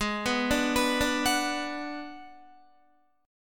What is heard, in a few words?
Ab Minor 7th Flat 5th Ab, down, Guitar, 4F, 4A, 5C, 5G, 5C, 6E; arpeggiated; JGuitar